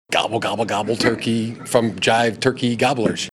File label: gobble gobble gobble turkey; jim harbaugh; from jive turkey gobblers